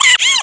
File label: ChuChu Stun